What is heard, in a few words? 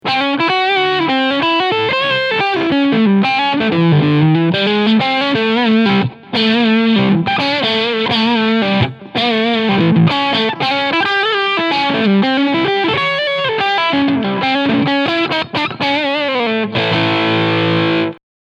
Humbucker Mid SC Overdrive; Larry Carlton S7-3TS 2nd Gen